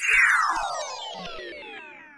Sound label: Utopia Recycle; Win98sounds